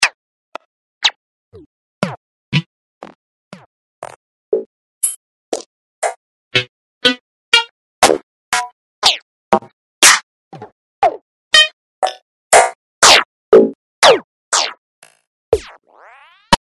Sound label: Click, click sound